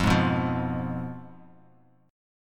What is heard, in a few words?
F Diminished F down Bass Standard; Fdim strummed; JGuitar